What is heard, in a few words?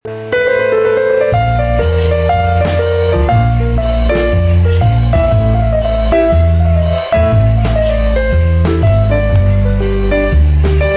trio piano bass and drums